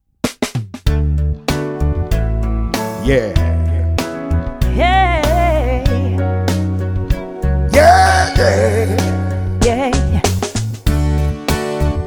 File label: Soul Singer; Frankfurt